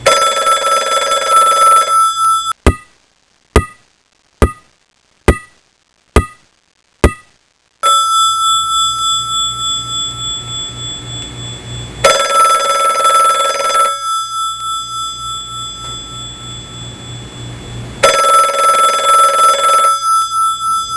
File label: Bell Phone; Soundsation